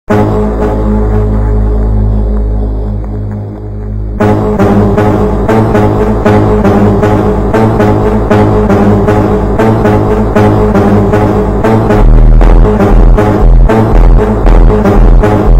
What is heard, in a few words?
Phonk MONTAGEM Slowed